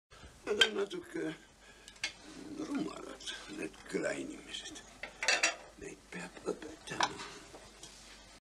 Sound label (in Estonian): laul